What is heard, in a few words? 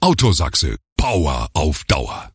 jingle; autosaxe; poweraufdauer